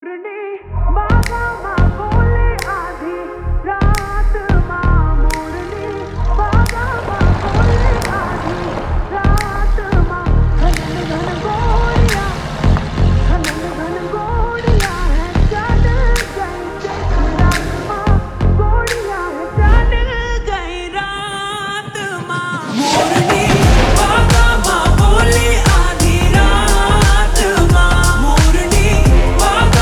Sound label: Slow Reverb Version Ringtone